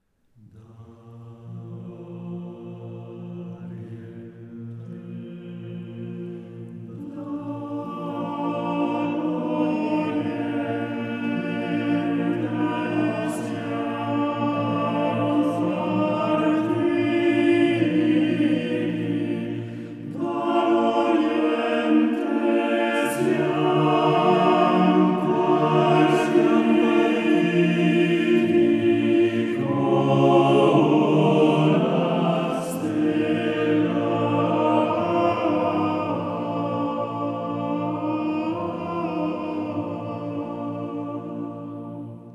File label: male Choir; Da L Oriente